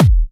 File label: Kicks; Vengeance Samples